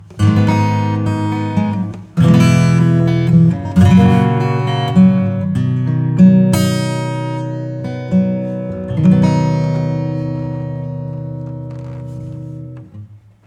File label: Reverb Acoustic; Tverb